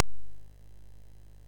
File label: sid MOS6581R2; California Games